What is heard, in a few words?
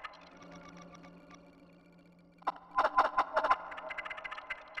Warp Wave Drum; Ambient Cinematic; Rhythmic Atmospheres